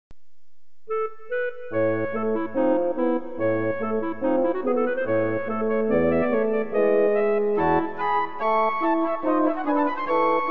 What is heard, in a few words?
divertimento gavotte